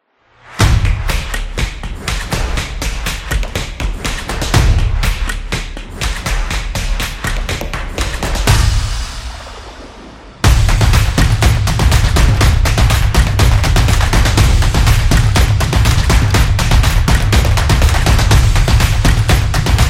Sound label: Zumba, sport drums